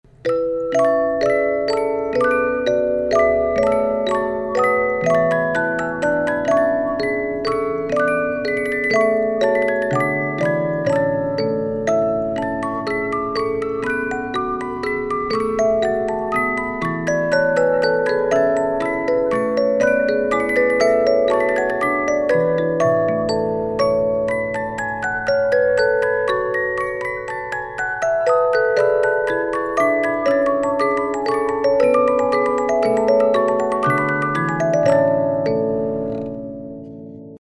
Eine Kleine Nachtmusik Minuet, music box; SANKYO

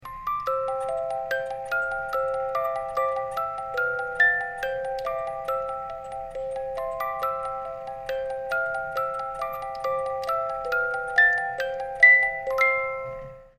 Piano Sonata in Minor, music box; Op 90 Beethoven

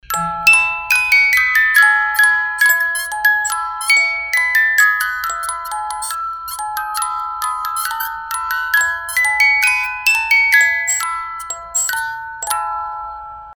musicbox

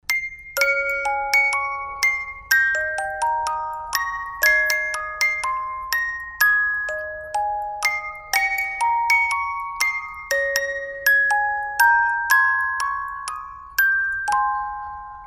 musicbox

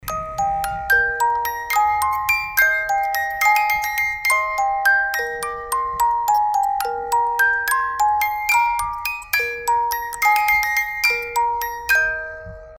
musicbox

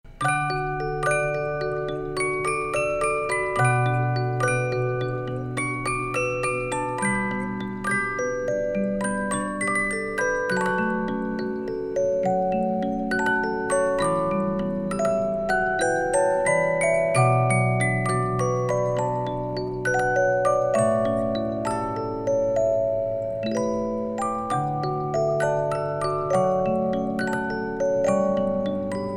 part 1, music box; SANKYO

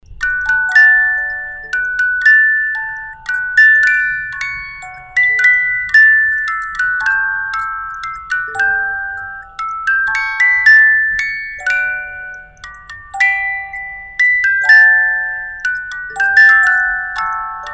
Lullaby Brahms, musicbox

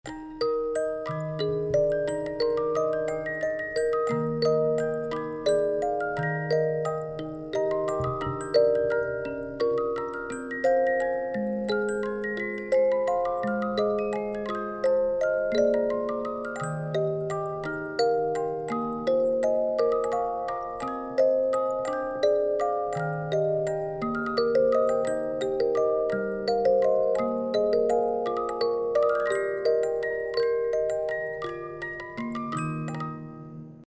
Waltz of the Flowers, music box; SANKYO

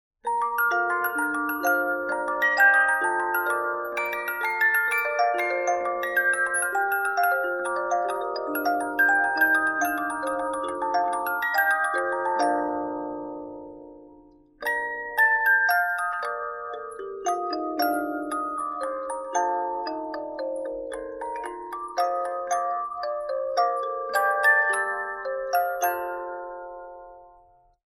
Air Gigue Handel, musicbox

